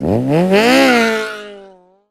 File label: Cars End